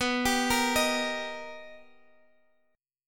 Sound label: B Augmented Major 7th, down; B+M7; arpeggiated